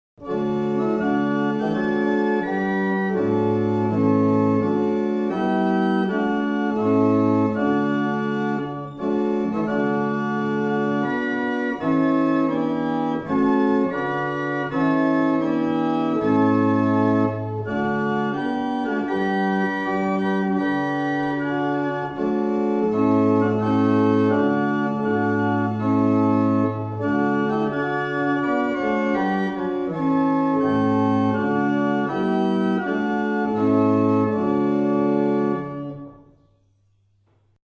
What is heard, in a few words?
St Olaves, barrel organs; Mattishall